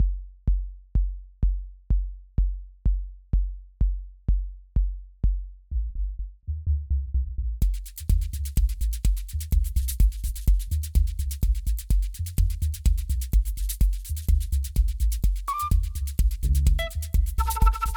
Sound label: fluting